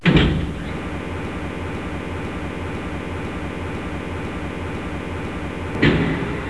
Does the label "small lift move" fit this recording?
Yes